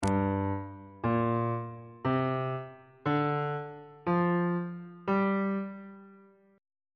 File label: g minor pentatonic scale on bass clef